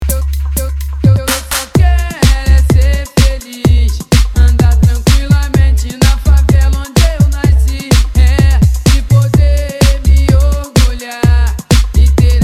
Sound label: retro